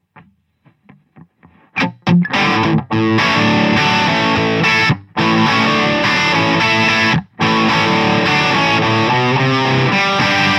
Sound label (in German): Dynamisch Rand Kalotte